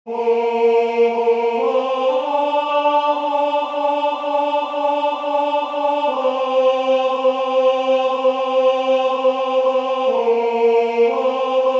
Tenor; ECCE QUAM SIT

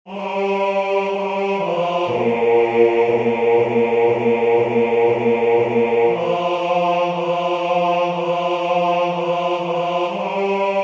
Bass; ECCE QUAM SIT

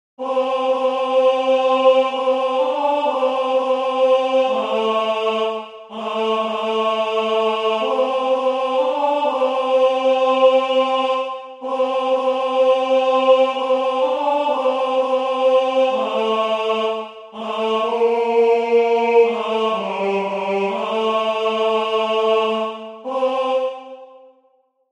LAUDATE OMNES GENTES TAIZE Tenor

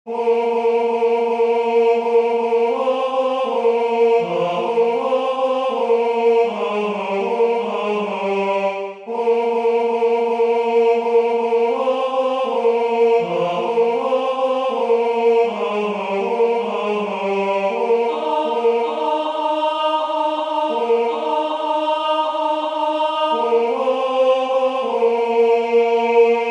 DRINK TO ME ONLY Tenor

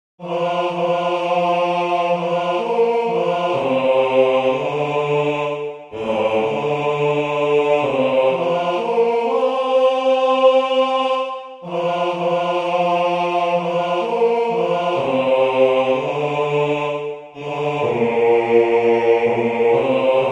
LAUDATE OMNES GENTES TAIZE Bass

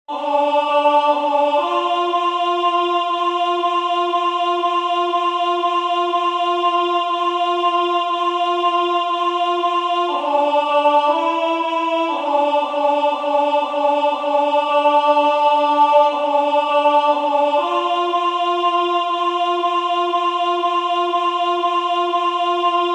Alto; ECCE QUAM SIT

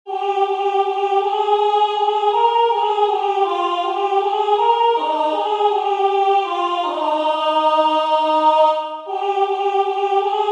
DRINK TO ME ONLY Soprano

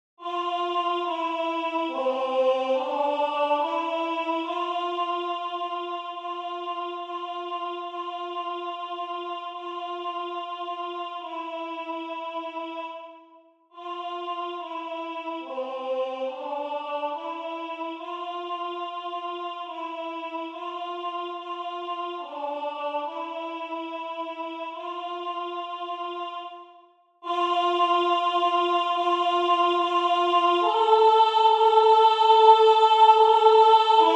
AVE MARIA Alto; JACOB ARCADELT

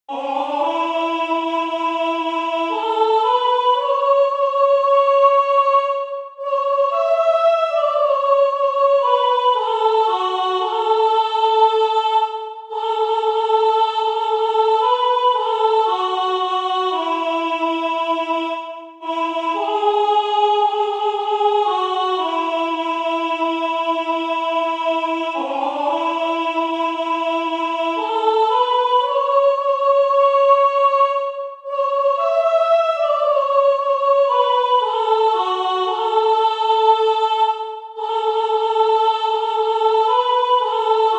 Soprano; K'HEB GEHOORD VAN EEN STAD

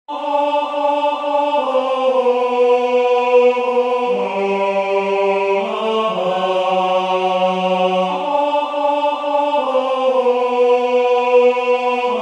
Tenor; UBI CARITAS

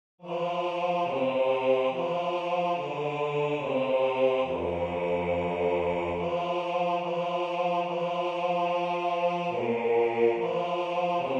Bass; AVE MARIA; JACOB ARCADELT

